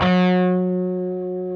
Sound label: PRS G, Guitars, LEAD